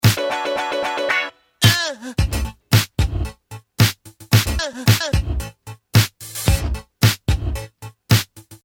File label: loop mono